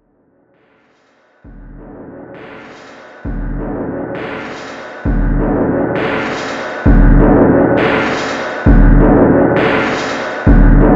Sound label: Locked Groove